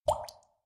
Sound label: rain